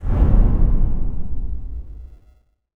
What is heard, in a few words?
discharge echo